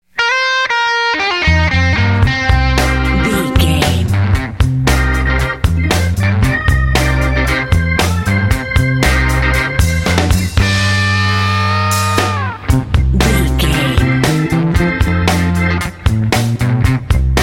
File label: hip blues riff